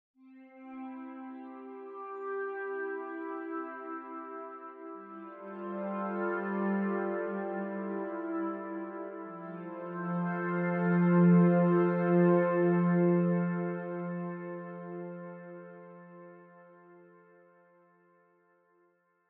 String Pad; Rainbow

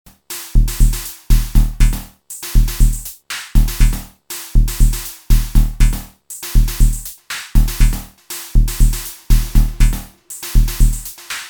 Transformer FX Tweaking; 1010music nanobox razzmatazz

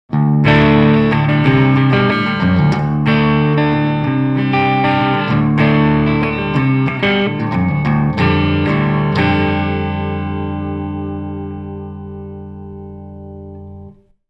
Amp 1 Channel A Boost

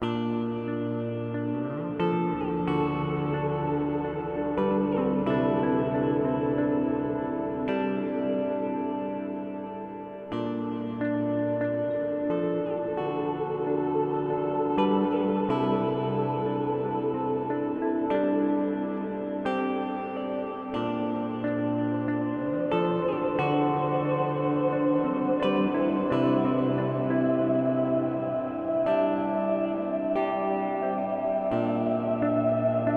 TC Electronic Hall of Fame 2 x4 Church

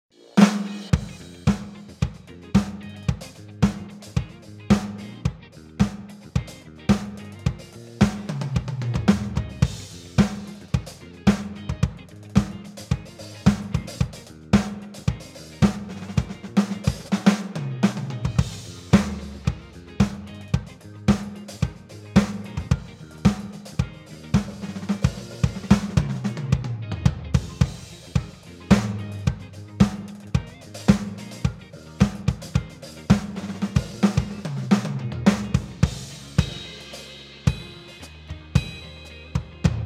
roland td 50kv

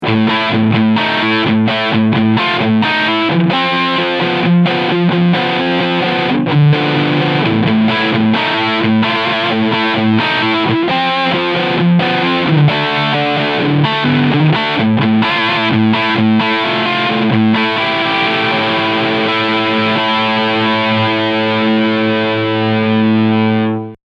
R 446 Riff Steg PU; Harley Benton

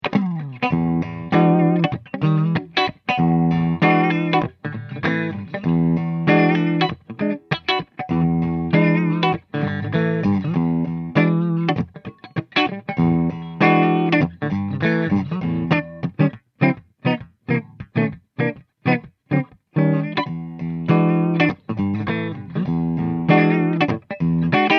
Koch JUP45 H Cool Channel Clean

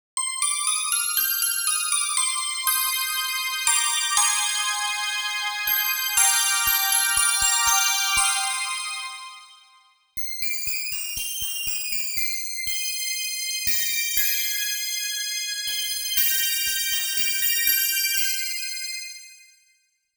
Aliazing Dexed

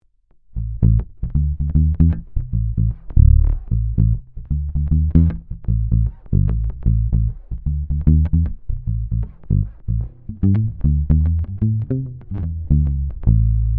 Ibanez EHB1505 Neck palm mute passive

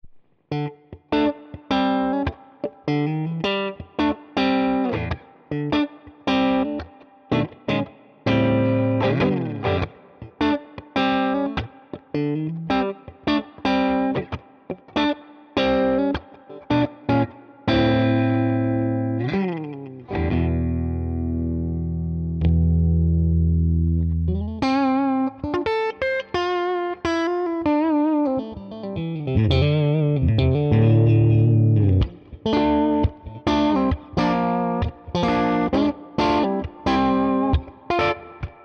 Tone King Imperial MKII Clean Basic

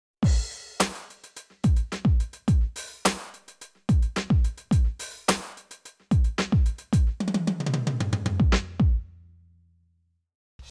Millenium MPS450 Dance

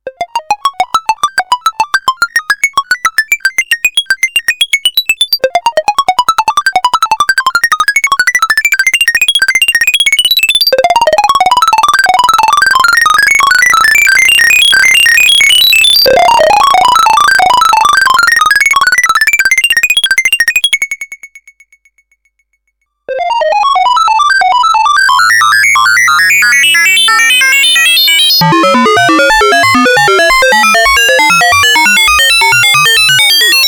RingMod

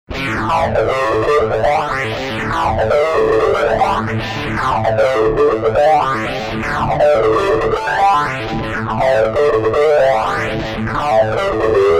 Preset Jet Flanger

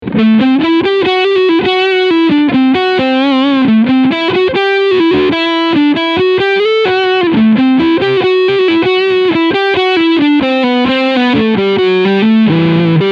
Ibanez AZS2200F Leadsound Neck Pickup

